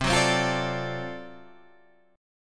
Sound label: D 7th Sharp 9th C, chord